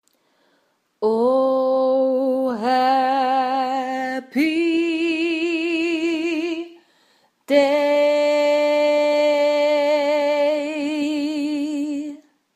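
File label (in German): Oh Happy Day Tenor Schluss, gospel choir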